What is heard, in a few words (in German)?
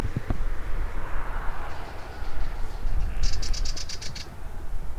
samtkopf-grasmuecke